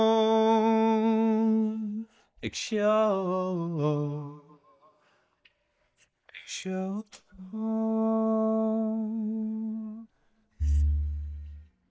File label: vocal; sgmse